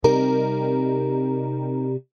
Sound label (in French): guitare